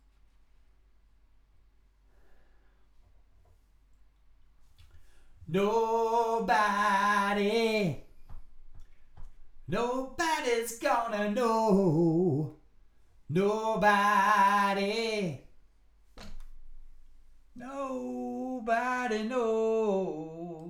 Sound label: vocals